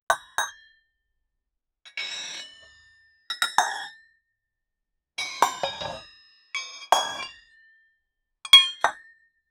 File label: crafting alchemy stuff